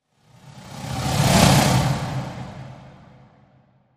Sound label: Fast Flyby